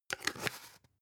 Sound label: spice jar big scratch close long; industrie wirtschaft; gastronomie